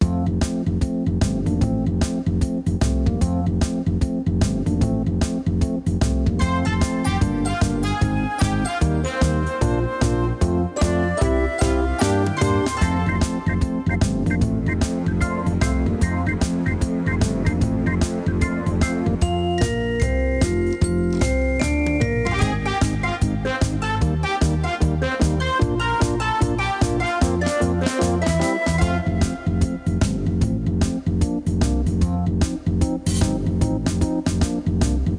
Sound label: Bumper Car Music